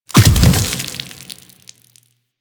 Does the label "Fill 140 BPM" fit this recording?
No